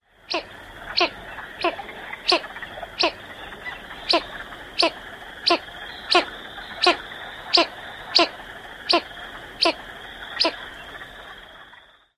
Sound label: male chux